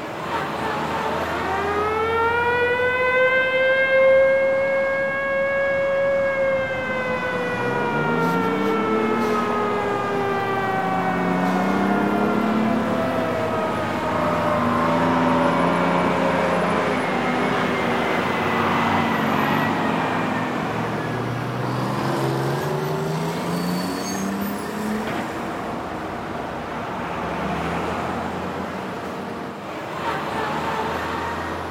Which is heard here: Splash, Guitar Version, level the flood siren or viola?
level the flood siren